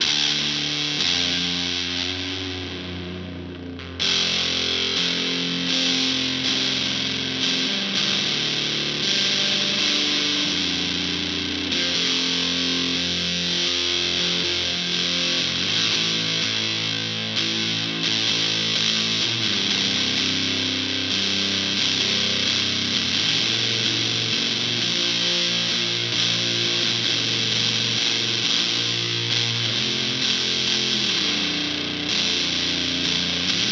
JHS Cheese Ball Mode 2

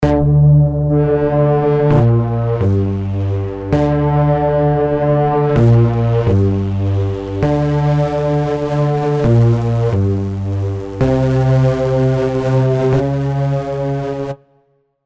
Bass Agressive Macro Modulation; Heavyocity Mosaic; Operator 1979

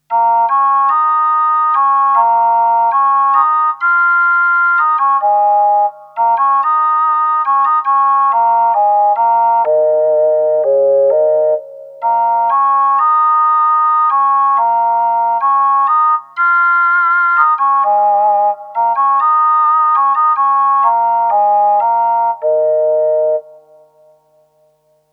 Prophet-5 Japan; Vermona VSR 3.2

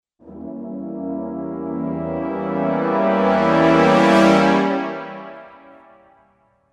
Brass Ensemble Crescendo

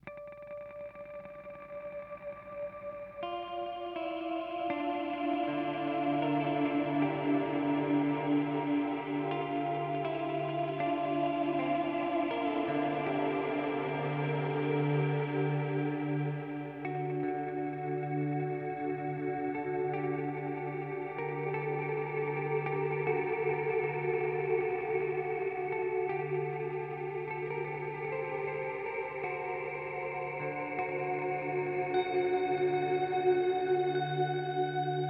HX Stomp Reverb Particle; Vergleichstest

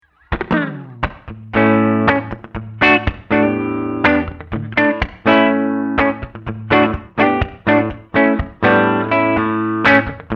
Clean

